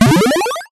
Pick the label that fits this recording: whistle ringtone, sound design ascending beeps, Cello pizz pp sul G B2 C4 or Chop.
sound design ascending beeps